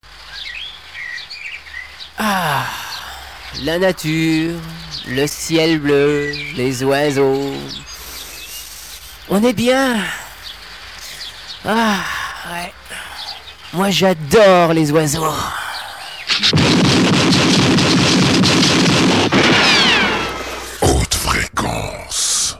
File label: nature